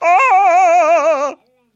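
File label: sounds with the scream of a burning person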